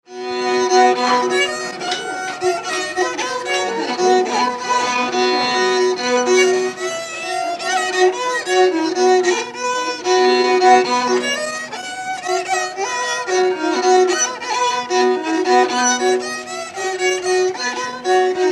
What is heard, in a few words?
violin